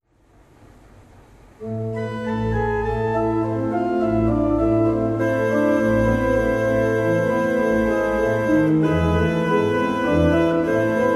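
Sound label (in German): Verschueren 20 II P, pfeifenorgeln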